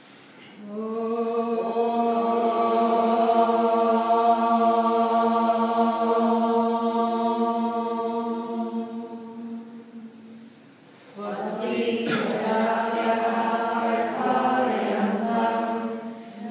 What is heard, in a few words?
Closing Chant